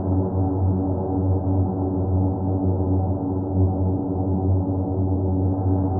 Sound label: InfiniVerb Voice, sparse soundscape Loops